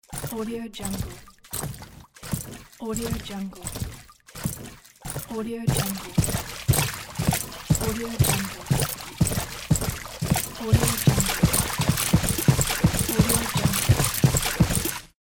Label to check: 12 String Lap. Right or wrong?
Wrong